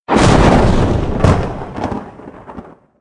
Lightning Far

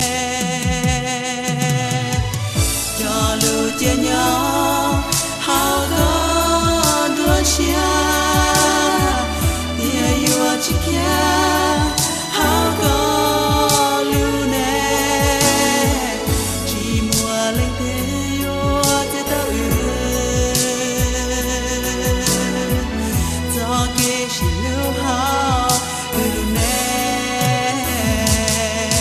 Hmong Song

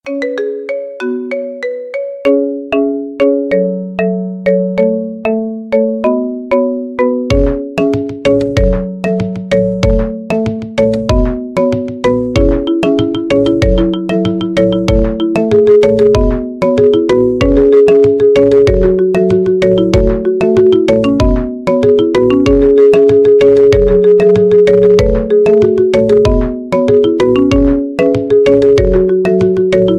Marimba

